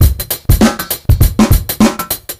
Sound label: Funk Break; сэмплы ударных